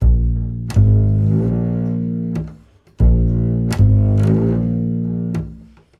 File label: 080 Acid Jazz Doublebass Bbmaj; Pro Tools Essential Loops; Digidesign